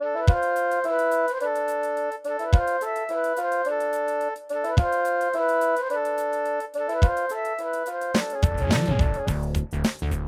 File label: Yamaha DD-55; Lost Vikings